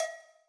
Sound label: Cowbell